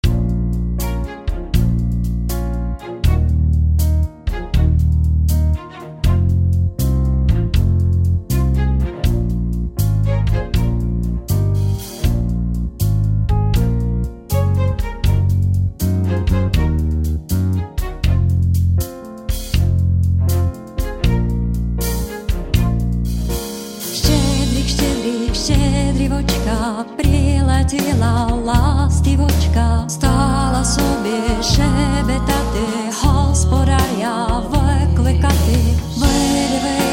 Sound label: Bells and Blues